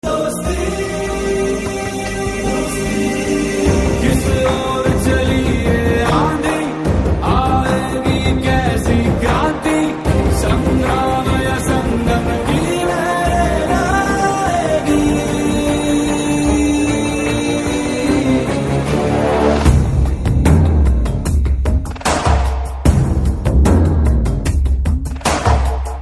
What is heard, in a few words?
Hindi Ringtone; RRR